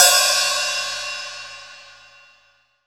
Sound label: T HH OP, STUDIO HH